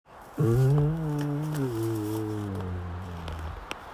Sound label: Tiger